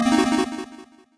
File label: ball hits hole, golf